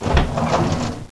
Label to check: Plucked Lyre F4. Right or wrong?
Wrong